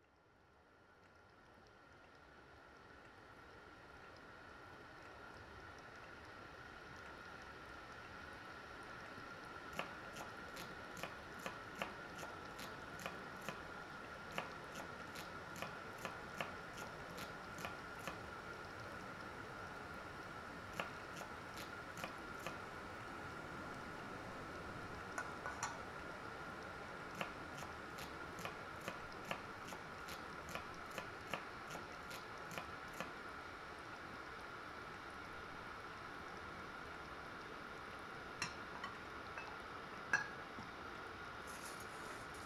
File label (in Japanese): 蒸しタオルでアイマッサージ, ASMR; たくさん食べたの。食休みはいかがする?